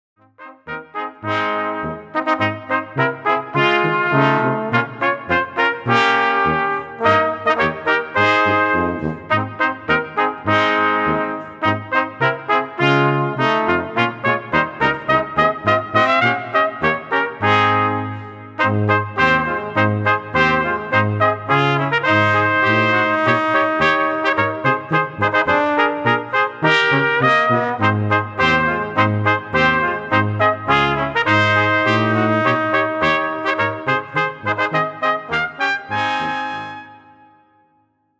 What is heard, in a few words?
jingle bells, brass band